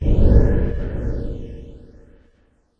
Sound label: dream echo; Various Strange Sounds and Effects